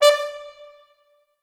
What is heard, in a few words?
D4 L, Orchestral Brass; Best Service ProSamples vol.20; AKAI